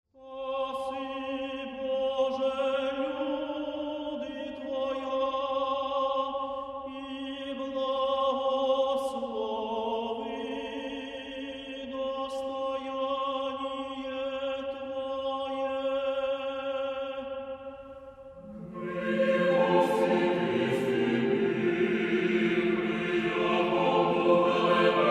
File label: Choir Liturhia; Kyiv